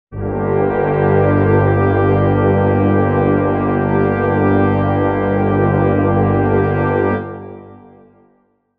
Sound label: brass chord g minor